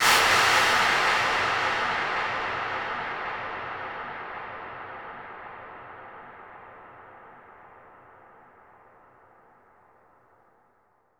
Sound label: clap, big rooms